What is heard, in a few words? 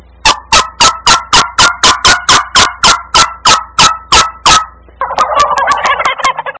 turkey lost hen and gobble Ringtone